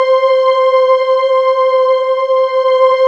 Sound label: PAD, Synth Pads; SYN-PADs; Synth-Pads 5